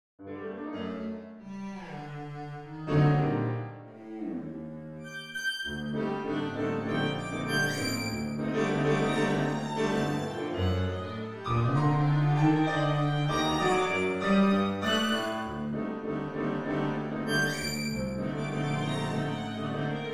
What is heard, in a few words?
Tango